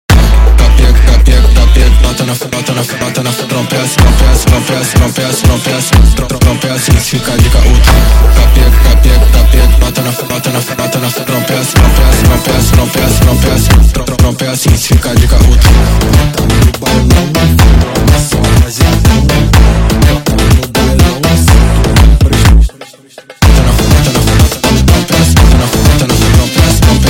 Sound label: Phonk MONTAGEM Sped Up